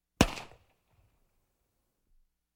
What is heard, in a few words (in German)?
Urwald 1 Gewehrschuss M14